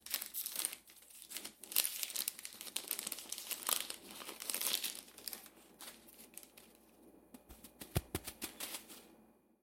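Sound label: opening a tea bag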